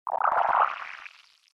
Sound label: tone